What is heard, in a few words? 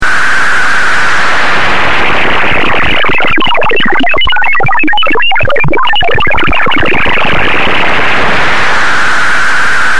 noise